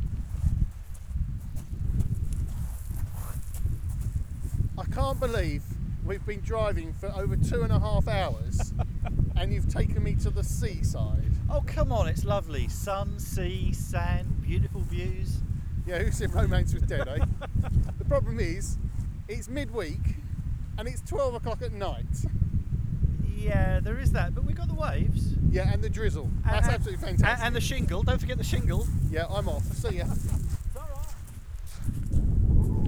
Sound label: beach, Olympus LS-10; Location: Beach (0m32s); WAV File - 16 bit Stereo 44kHz; Olympus LS-10 and LS-11 Recorders Reviewed